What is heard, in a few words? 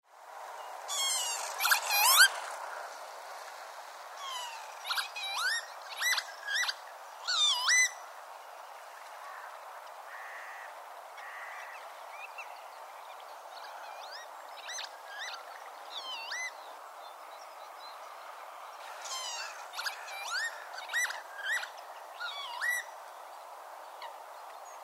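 chibis golos, lapwing voice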